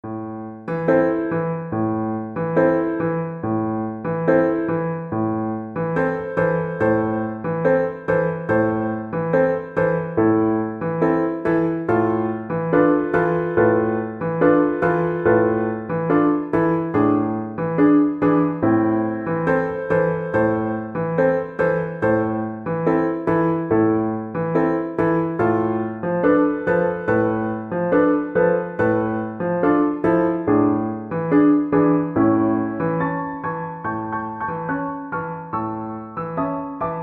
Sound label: habanera BSN, Bassoon